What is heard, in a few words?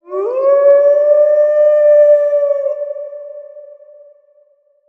wolf lord roar